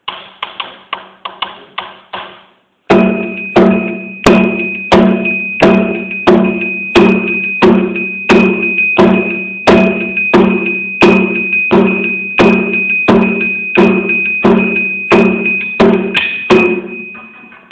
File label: Kenkeni; Kuku